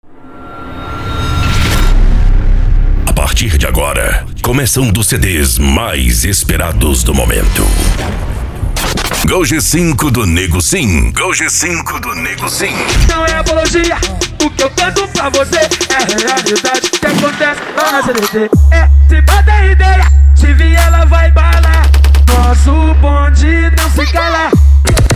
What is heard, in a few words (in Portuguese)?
funk mega bass e noia dance